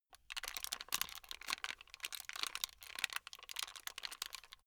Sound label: plastic rattle slow